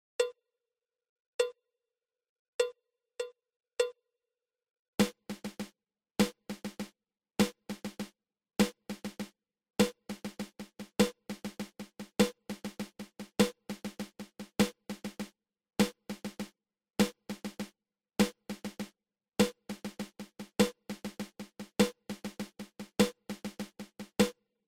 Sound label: Single Ratamacue